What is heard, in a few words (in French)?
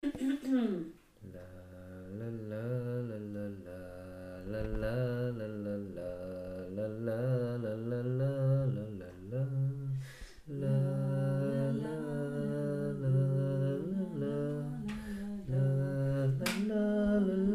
canon; hashivenu